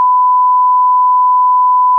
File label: test tone 1000hz; rspektrum